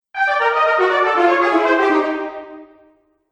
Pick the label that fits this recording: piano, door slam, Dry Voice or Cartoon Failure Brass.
Cartoon Failure Brass